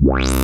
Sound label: MOOG BASS